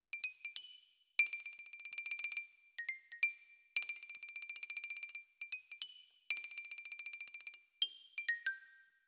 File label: Xylo Close